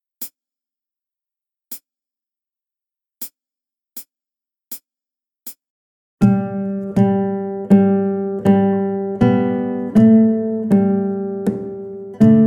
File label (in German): Ene mene munkel, guitar; Play Guitar Together 1